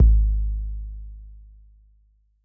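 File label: Bass Drum Long; Yamaha DJX-2B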